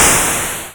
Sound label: explosion; DelphiArcadeGames; AlienInvasion